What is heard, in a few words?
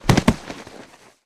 Human body fall on dirt grass